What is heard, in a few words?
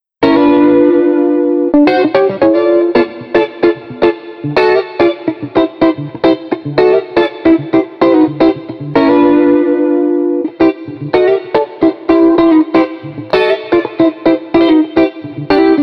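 Street Guitar Tele Funky Jazz, 110 bpm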